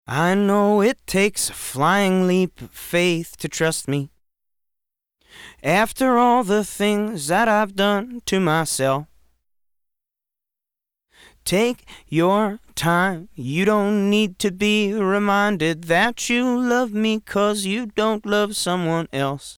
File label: vocals moderate